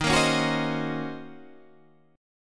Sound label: Eb Minor 13th Eb down Guitar Standard; Ebm13 strummed; JGuitar